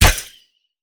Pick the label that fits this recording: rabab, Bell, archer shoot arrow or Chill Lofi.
archer shoot arrow